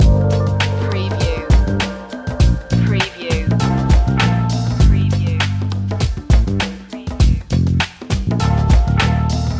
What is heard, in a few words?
chilled loop